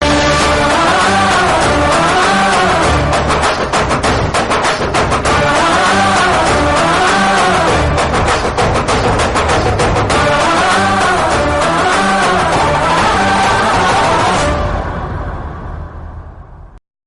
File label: BGM Telugu Ringtone; NTR; Dhammu